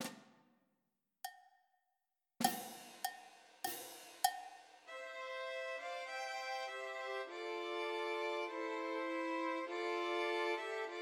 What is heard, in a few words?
Trios for Three Violins; In the Bleak Midwinter; Christmas